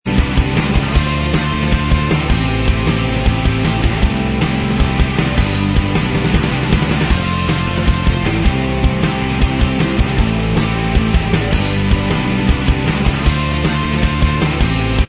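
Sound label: instrumental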